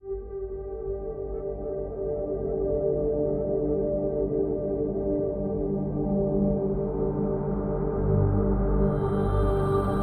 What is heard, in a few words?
Drone